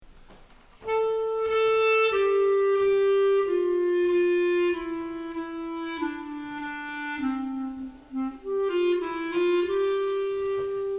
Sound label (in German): Band Aufnahme Berliner Halleluja